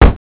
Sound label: snare